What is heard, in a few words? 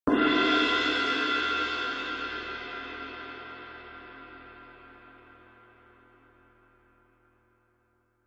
K Constantinople Suspended, cymbal